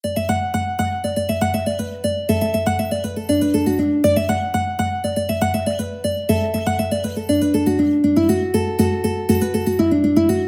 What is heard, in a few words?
January, mandolin